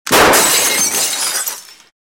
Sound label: Wood & Glass Crash; Crashes Impacts